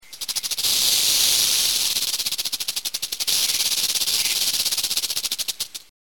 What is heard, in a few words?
rattlesnake